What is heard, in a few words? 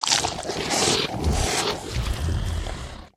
zombie eat; monsters